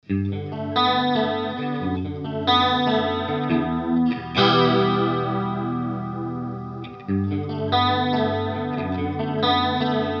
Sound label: Walrus Audio Julia chorus 3 lag full sinus